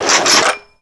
chaingun reload; gusanos promode

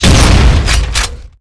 flak; gusanos promode

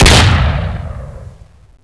auto shotgun; gusanos promode

